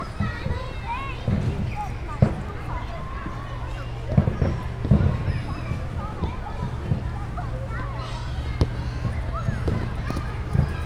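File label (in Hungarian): pattogo labda jatszoter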